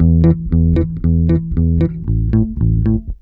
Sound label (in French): PICK BASSE; USB Soundscan vol.32; Roots Disco; AKAI